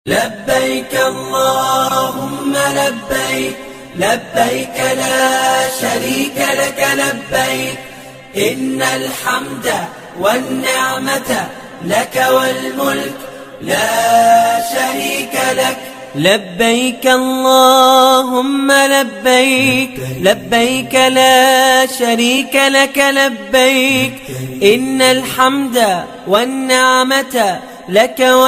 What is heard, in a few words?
islamic ringtone; labbaik allahumma labbaik